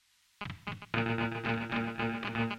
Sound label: musescore pad